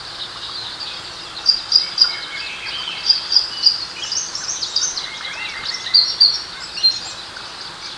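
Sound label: ambience summer birds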